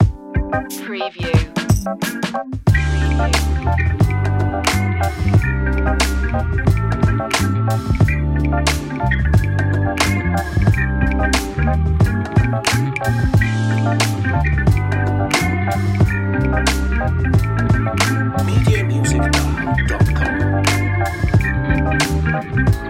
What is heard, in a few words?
pretty cool funky, chill hop